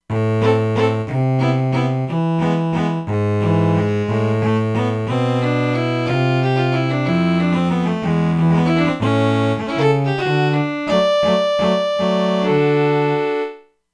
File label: Gamba, E-mu Proteus-2; Factory Presets; Gamba Musik